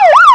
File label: police; rigs of rods